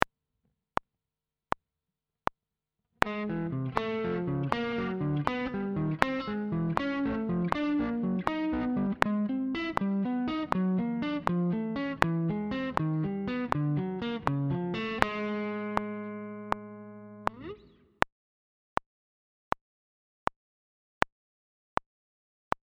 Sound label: slow 80bpm